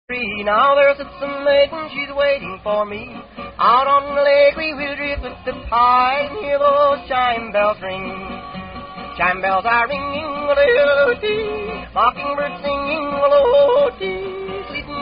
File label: Yodel Song; songs from korat RTAFB